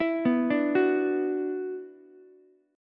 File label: F sharp 7th Suspended 4th Sharp 5th F sharp down Ukulele; F#7sus4#5 arpeggiated